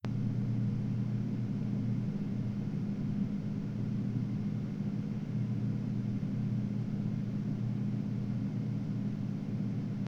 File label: 1000 RPM